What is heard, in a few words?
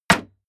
block wood